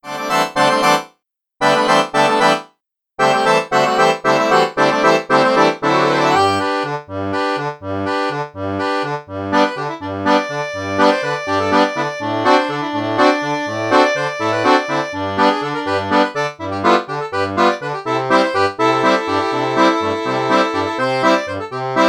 Waltz Caprice Bayan Duet; Accordion

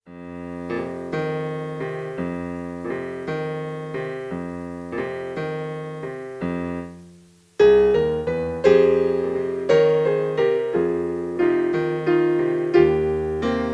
German folk song; arranged for solo piano

